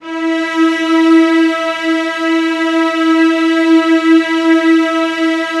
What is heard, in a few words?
VIOLAS F#4; ILIO; Synclavier Strings